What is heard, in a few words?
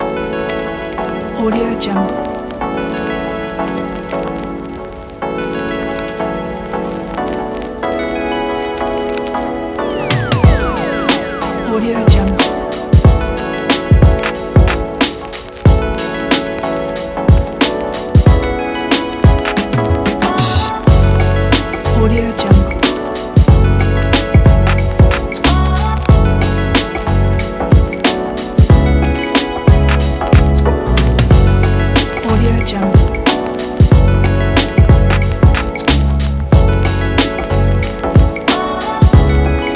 hip hop ambient vibe